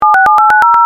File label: phone dtmf; Phone DTMF dialing